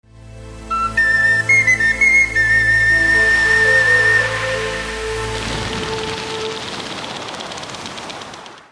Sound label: jingle; terres et eaux